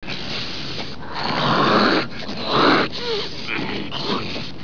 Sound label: clearsinus